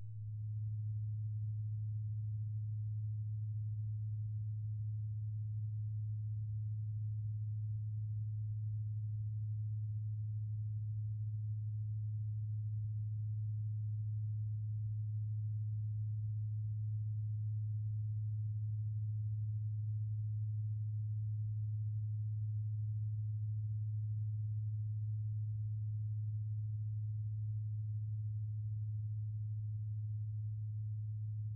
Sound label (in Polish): tlo pracuje dmuchawa organ